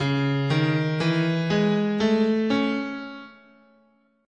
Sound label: C sharp Hirajoshi, scale